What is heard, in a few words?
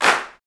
CLAPS, Africa Handclaps